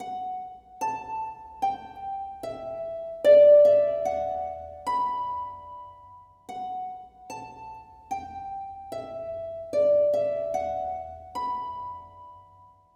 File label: melody b needolin loop